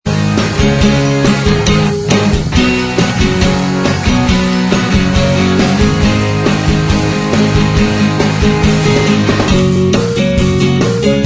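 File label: instrumental, backing tracks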